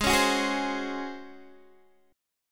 Db Major 7th Suspended 4th Ab

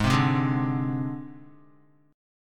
G sharp Major Flat 5th, Guitar; G#Mb5 strummed; JGuitar

